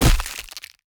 Siege Impact Creature Large

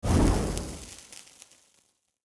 Fire Extinguished

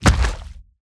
Generic Creature Big Footsteps Mud

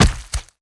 Body Falls Head Mud Dry; BIGMOUTH

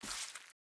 med Egg

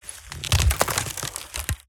Tree Break Bend

